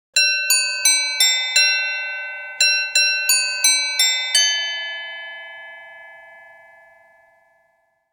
jingle bells message tone

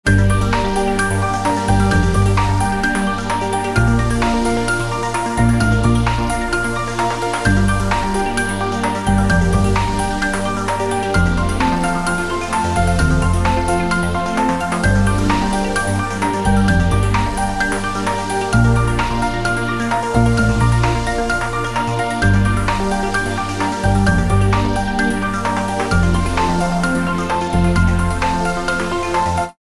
electronic music with piano melody ringtone

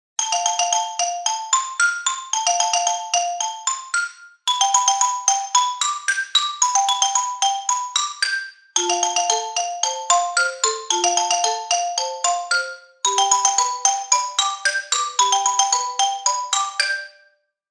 wooden xylophone ringtone

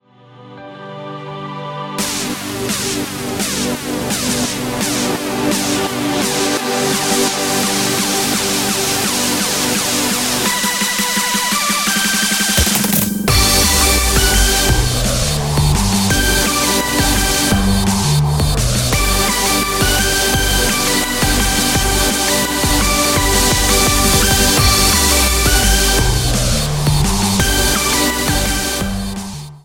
music ambient

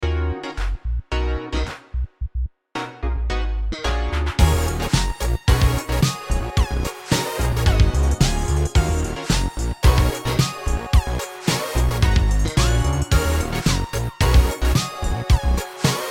fusion jazz ringtone

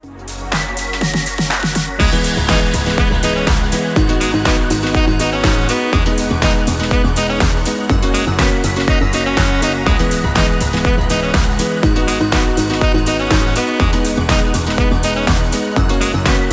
classique ringtone

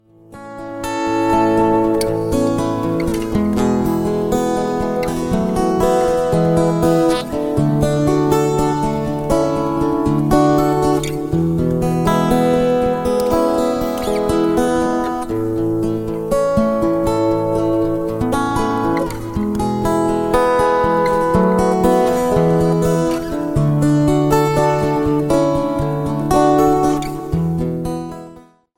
love guitar ringtone

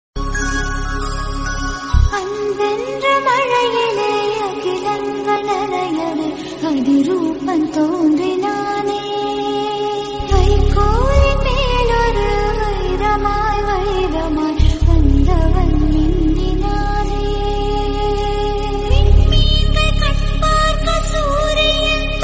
Bgm Instrumental

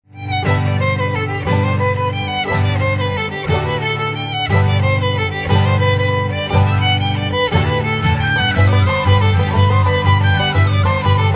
garry owen 7th cavalry ringtone

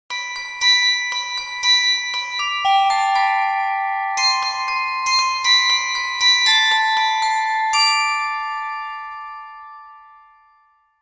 xmas bells ringtone

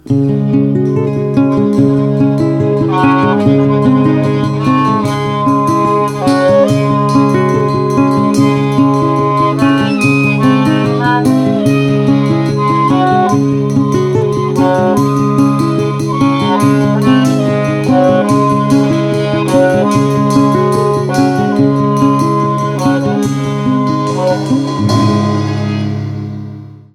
ringtone

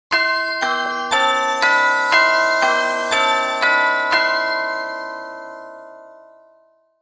bells

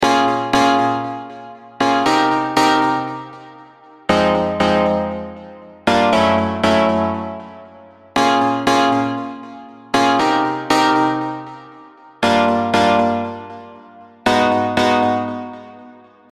softsynth music ringtone

